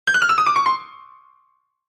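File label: Grand Piano Fast Comedy; keyboards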